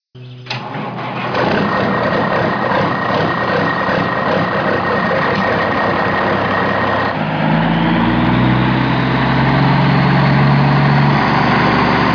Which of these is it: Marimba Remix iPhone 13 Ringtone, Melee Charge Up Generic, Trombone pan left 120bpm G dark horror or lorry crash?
lorry crash